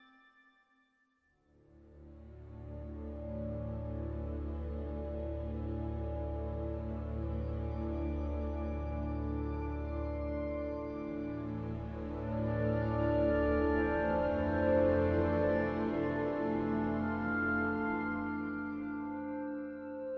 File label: score Graveyard; Journey